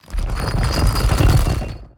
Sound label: wheel snd; netent; eldorado; gonzo